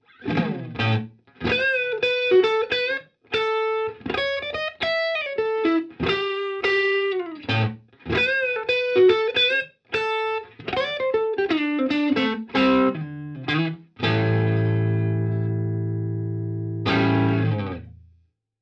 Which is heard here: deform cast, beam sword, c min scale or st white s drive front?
st white s drive front